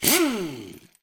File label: Upgrade; Rally Champ